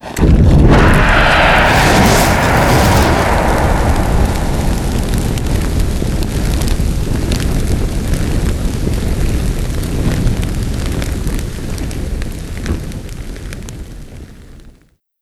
player ignite front; estate; Call of Duty Modern Warfare

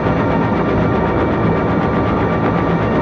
ship engines; World Of Warships

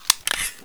Inf Weapons Foley 3rd; Red Orchestra 41-45

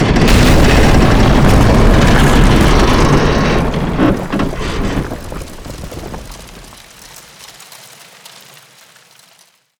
wall crumble; Call of Duty Modern Warfare

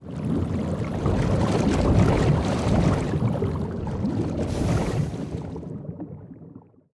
submarines engines; World Of Warships

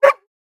whimper; Rust

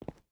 footstep rubber boots concrete walk; Rust

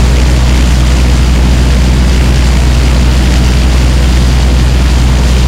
blenheim rear, engines; War Thunder

